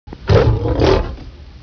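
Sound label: lion roar; ANIMALS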